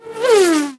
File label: Speedy, cartoon; Classic SFX; musicradar; cartoon caper